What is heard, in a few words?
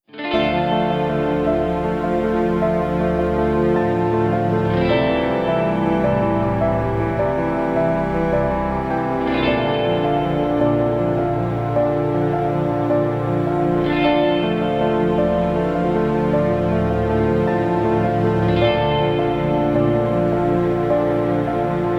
guitar comp; by burak sound bank; dramatic dram vol 17